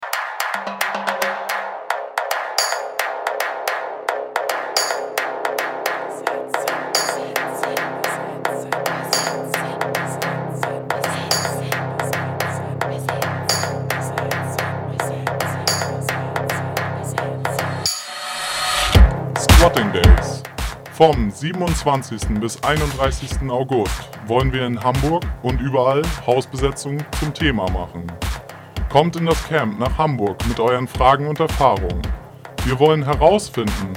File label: Jingle